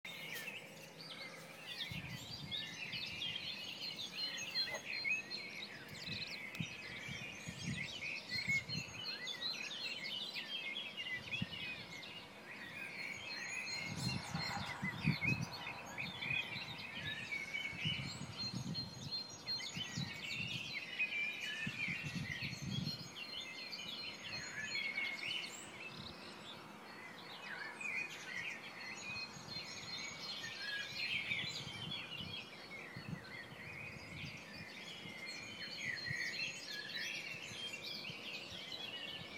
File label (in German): Vogelkonzert